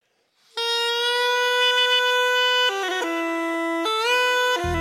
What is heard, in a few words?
Bg Pipe